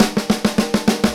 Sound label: FILL